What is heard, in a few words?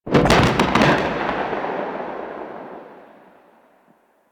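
Gas Distant Cloud Explosion; Ambience; Warframe; Corpus Gas City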